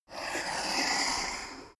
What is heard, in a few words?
poker chip slide table long